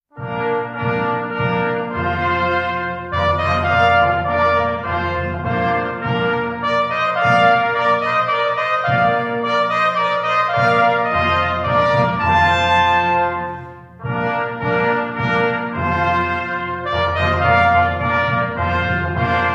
Aufzugsmusik

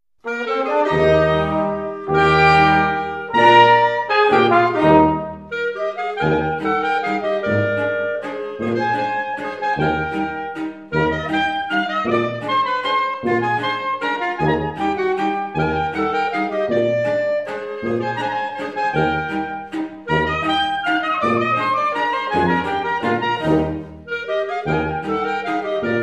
Halbwalzer; Haag

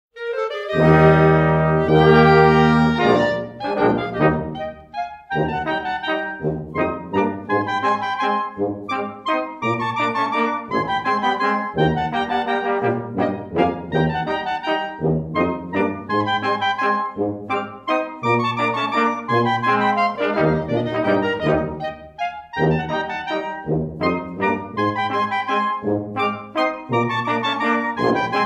Halbwalzer

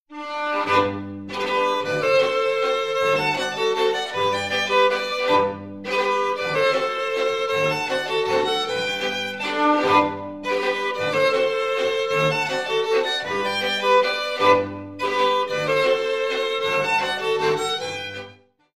Lentler

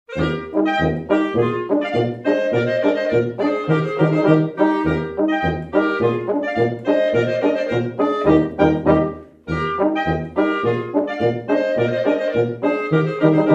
Schottisch; Die Kuah

